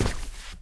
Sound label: Big Mud Man walk